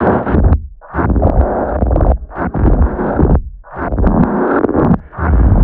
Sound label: Loop, 70 bpm, Circuitbend